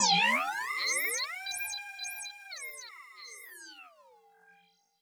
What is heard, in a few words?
TRAP TRANSITIONZ